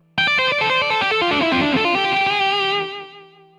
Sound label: start; codeskulptor; riceracer